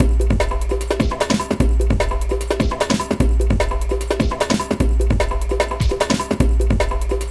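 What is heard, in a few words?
drum n bass; INIS; Funky Xtreams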